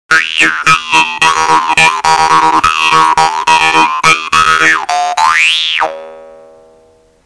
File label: doromb